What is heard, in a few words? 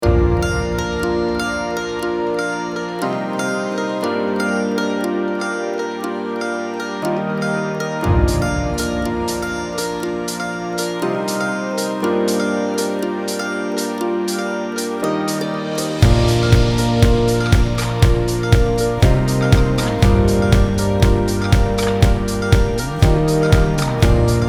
Indie Jingle; FILM SCORES